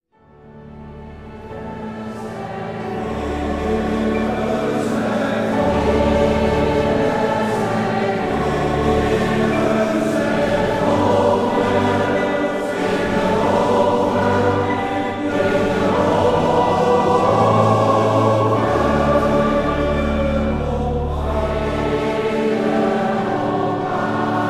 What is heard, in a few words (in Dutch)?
ere zij god koor en publiek samen; J. Schulz; J. v.d. Waart